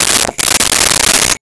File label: Rotary Telephone Dial